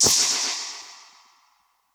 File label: Perc Hit, dub percussion